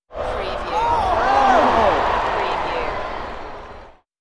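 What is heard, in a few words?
Arena Crowd Oh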